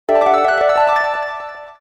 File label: Atmosphere, ringtones; Sony Ericsson; G502